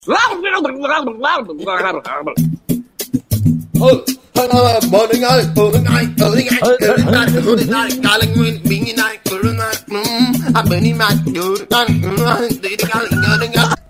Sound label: blargh